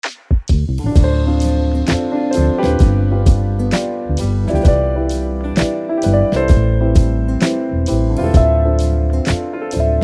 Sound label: Nostalgic Piano Beat